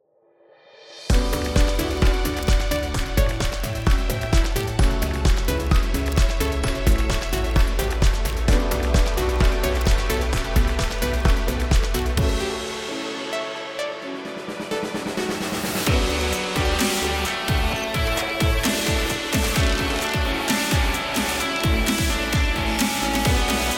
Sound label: ELECTRO ROCK SAMPLE SOMG